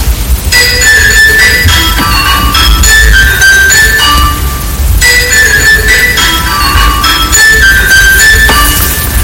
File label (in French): sonnerie lycee; lycee louis armand